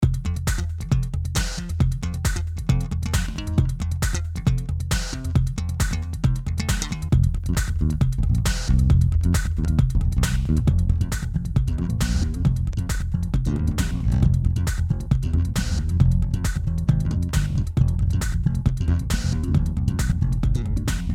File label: Drum'n Bass